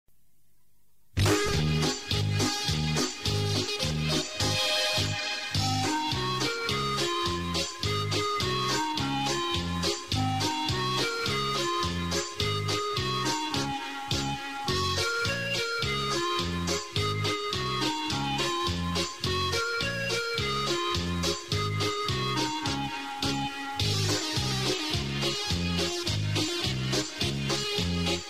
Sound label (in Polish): krakowiak